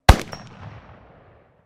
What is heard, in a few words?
MUSKET FLINTLOCK UNKNOWN FIRING RICOCHET, at a shooting range; Black Powder firearms at a shooting range; Gun Shots